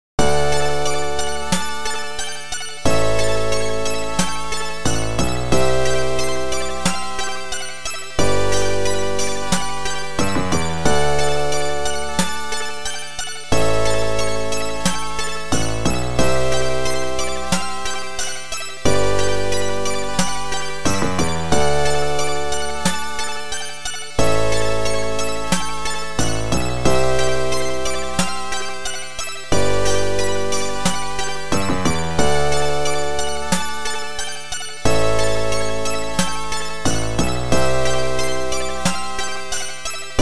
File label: Game Clear & Game Over; Surf Ninjas